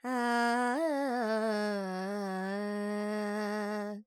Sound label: Phrygian, Vocals, Carnatic Female, Alaps, Dry